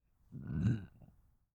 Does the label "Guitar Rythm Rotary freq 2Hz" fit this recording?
No